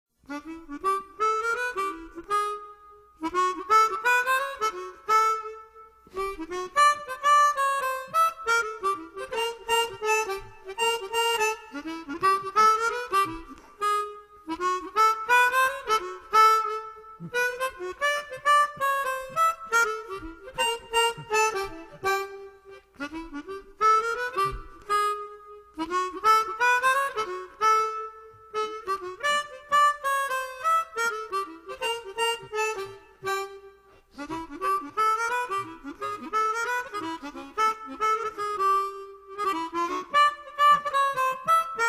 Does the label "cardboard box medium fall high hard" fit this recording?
No